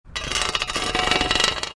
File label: Garden shovel dragging scraping on concrete